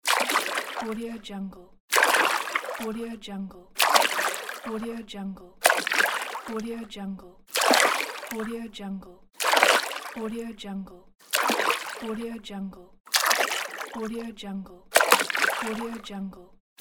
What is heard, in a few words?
swim